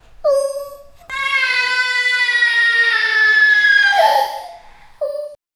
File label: realistic child crying; emotions